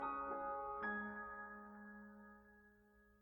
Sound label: outro music